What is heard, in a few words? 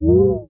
Mega Beast Core Egg Hit